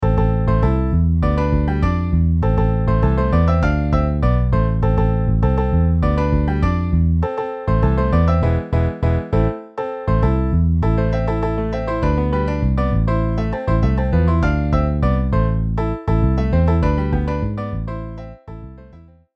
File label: Piano Bass MIDI